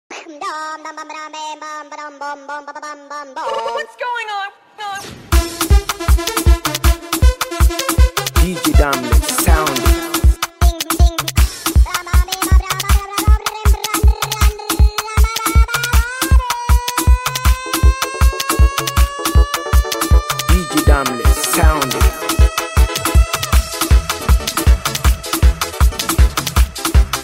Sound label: Mara Dance Beat